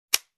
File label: Switch click sound